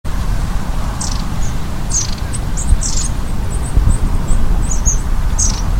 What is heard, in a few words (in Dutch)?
Staartmees; tijdens de vogeltrek